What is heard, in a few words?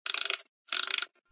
see saw; Rolling-Panda